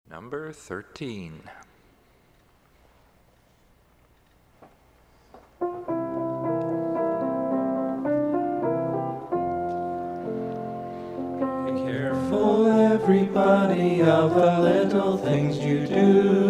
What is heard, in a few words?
Hymn